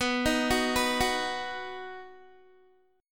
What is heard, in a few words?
B Major, Guitar, 4E,4A,5D,5G,5C,6F; arpeggiated; 19,18,16,16,18,x